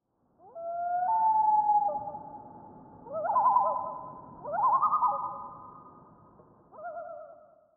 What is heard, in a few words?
loon; ambient